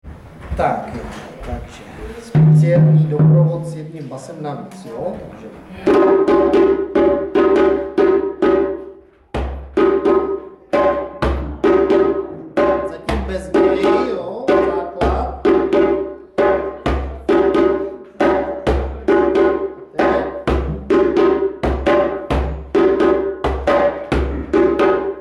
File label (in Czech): Bubny, djembe